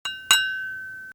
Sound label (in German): Tasse leer